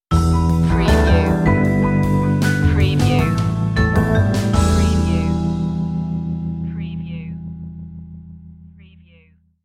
central groove stinger

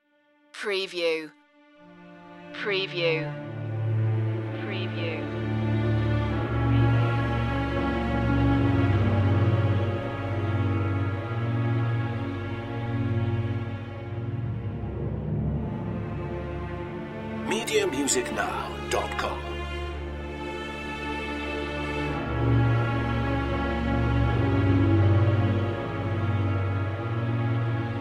no choir, doom laden

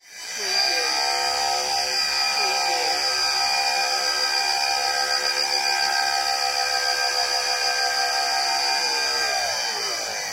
Servo Motor; Sound Effects Bible

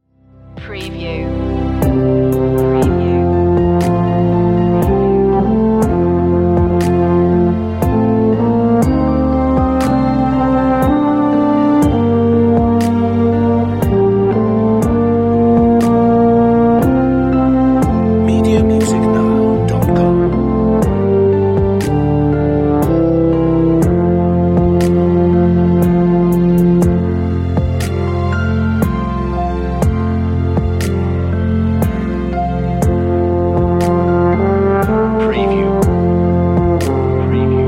away in a manger euphonium feature; christmas; traditional

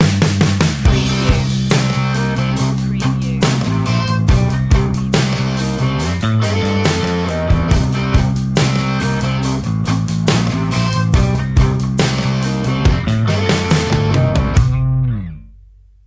dirty mud stinger, loud

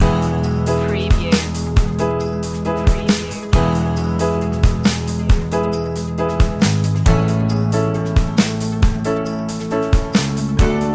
breezy inspiring

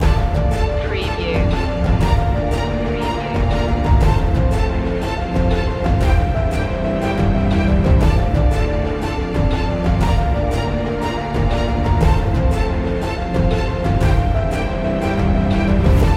epic emotional cinematic, trailers

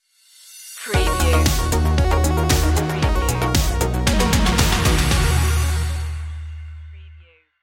retrowave stinger; c64

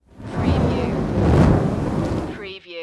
Fire Torch Single Movement Whoosh; fire ball; lynne-publishing

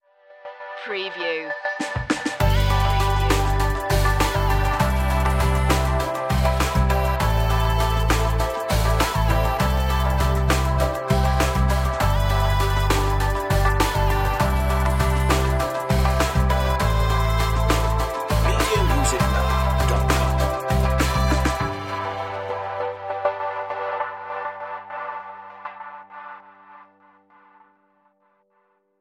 funky dream; enchanting

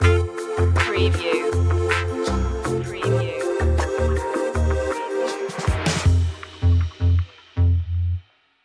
upbeat cool stinger; funky groovy

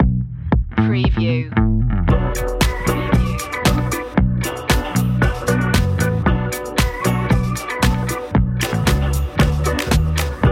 Underscore; bitter

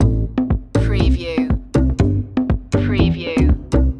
loop, latin spanish

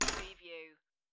Poker Chips Drop One Chip On Wood Table; lynne-publishing

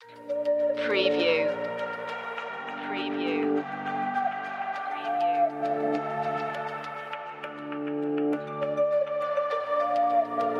uplifting atmospheric; ethereal

